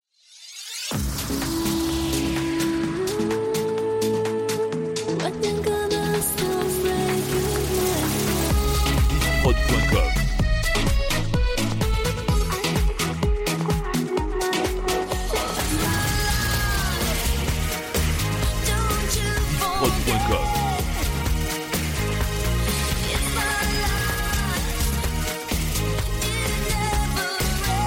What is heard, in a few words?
Medley Retro 2000s